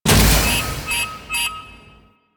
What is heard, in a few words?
Railjack Weapon Overheat Short; Modular Weapons